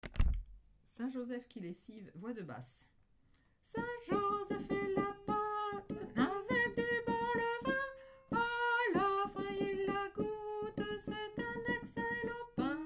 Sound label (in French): basse; saint joseph